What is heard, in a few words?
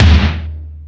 Toms, Drum Machines E-MU Pro-Cussion